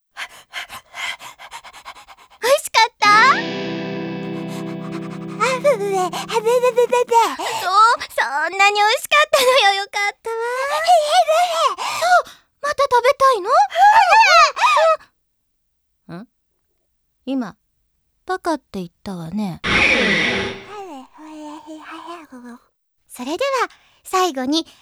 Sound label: Cooking Guitar Class Ending; Canary Kono Omoi o Uta ni Nosete